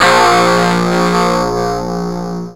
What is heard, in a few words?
SYNCLAV GTR, SYNCLAV HITS; Greytsounds VOL-1